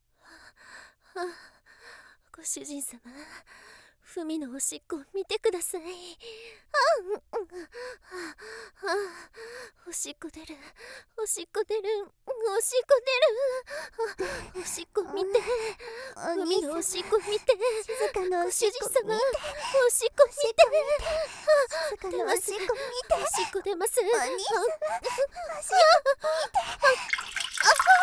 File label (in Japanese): 3人のおしっこが途切れないループ